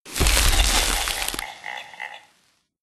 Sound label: spooky treat bag use